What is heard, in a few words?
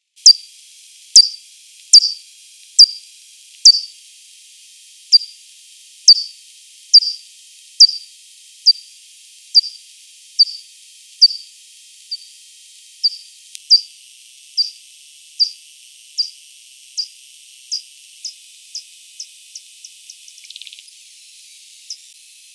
P pipistrellus; Allier; S-Giosa